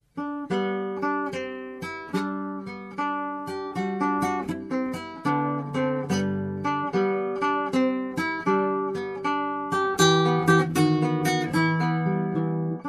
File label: Allegro grazioso; Carulli